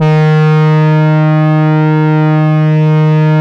REED, E-MU Producer Series Vol. 6 – Analogia Project, Strictly Synthe, Smoov Leadz